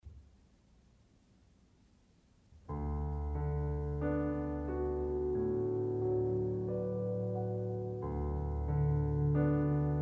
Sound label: Piano and Flute